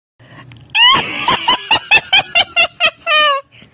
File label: witch laugh